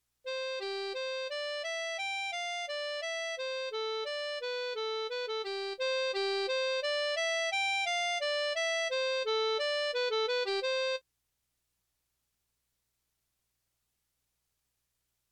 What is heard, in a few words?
C lead in harp